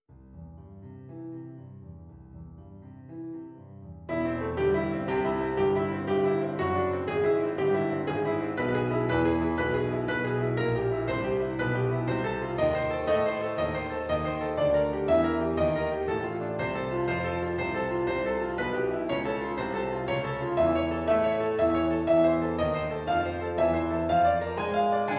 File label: Playful Piano